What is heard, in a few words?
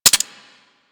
M16 Rifle Mech; Grineer